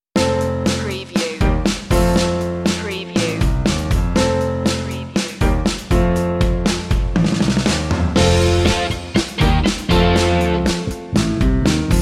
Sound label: motown sparkling